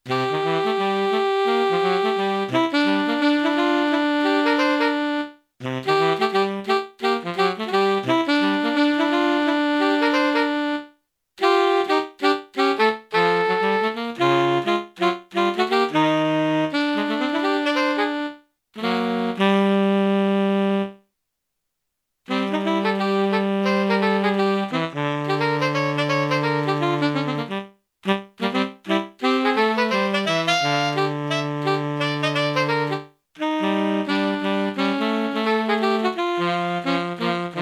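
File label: Tenor Sax, STANDARDS TENOR SAX DUETS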